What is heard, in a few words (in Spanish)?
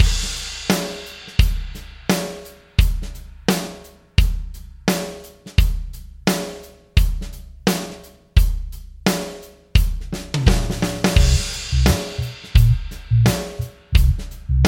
pista de ejercicios de destreza y velocidad